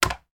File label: food yoghurt pot fall over on table